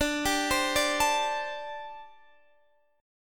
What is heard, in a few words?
D 7th Suspended 4th, D, down, Guitar Open D